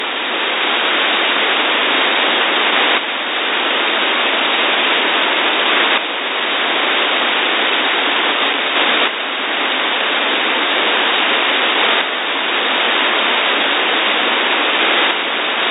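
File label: AOXIANG 1, satellite; received the 9600 bd BPSK downlink signal on 436.150 MHz of AOXIANG-1 on July 31st 2017 at 17:50 UTC; XQUAD; AoXiang-1 is for lower thermosphere detection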